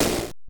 Final Snare; Dr Robotniks Mean Bean Machine

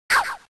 bomb step on; WarioWare Inc; Mario2

